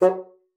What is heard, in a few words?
bassoon, F#3; Xsample VOL-6